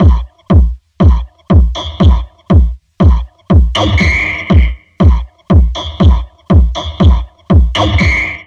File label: Drum Loops Crazy Processed